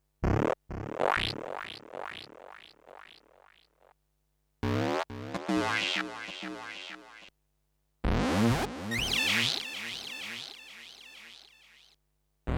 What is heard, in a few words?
KP3 Synthies